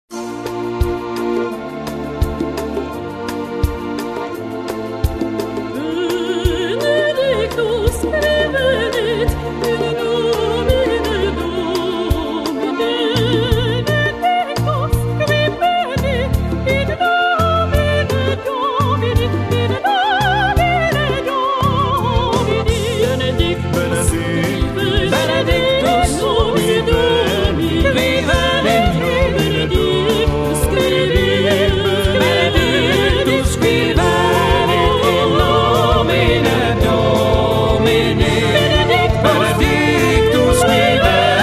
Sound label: rock